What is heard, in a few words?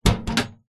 metal box large set down soft surface